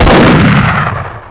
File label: shotgun fire